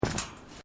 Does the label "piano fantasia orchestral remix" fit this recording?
No